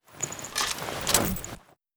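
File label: blade one hand draw, equip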